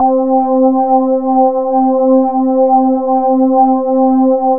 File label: MKS80, PADS; Masterbits; Vintage Voltage